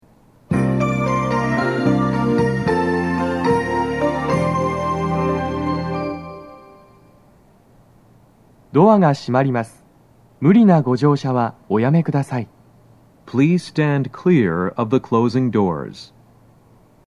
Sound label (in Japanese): 発車サイン音, Panasonic天井型での収録; 西葛西